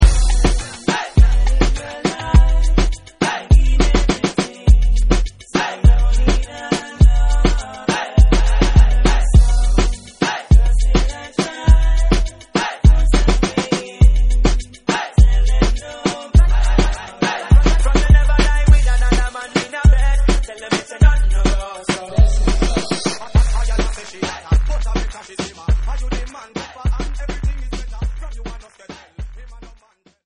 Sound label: Reggae MiniMix